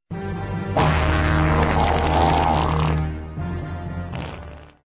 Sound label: farts